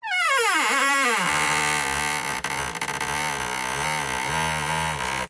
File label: Wood Stress Creak Squeaks; DPA4060; PMSFX